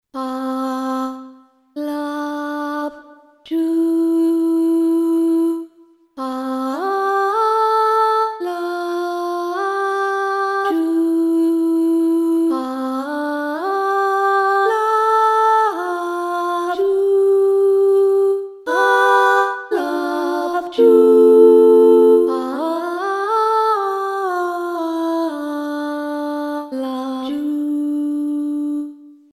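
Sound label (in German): RealiVox Blue Klangbeispiel i love you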